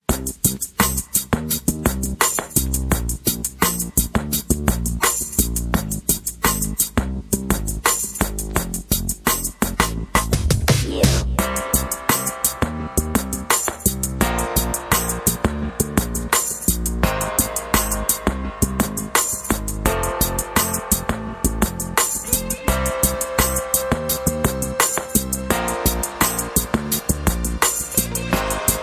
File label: Bass